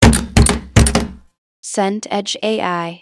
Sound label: door knock triple